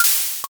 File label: syringe